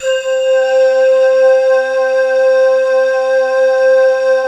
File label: glockenflute, Glocken Bell; Optical Media International; Sonic Images Library